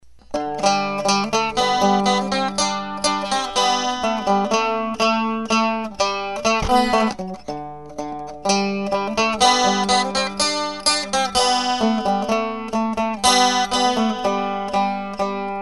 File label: Tamburitza